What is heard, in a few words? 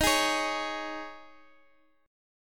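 E flat 7th Suspended 4th, Guitar, DADGAD; x,x,x,8,11,11; down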